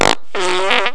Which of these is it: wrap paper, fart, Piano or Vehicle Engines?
fart